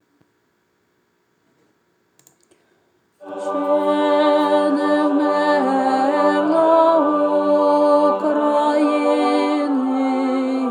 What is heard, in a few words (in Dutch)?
hymne oekraine tenor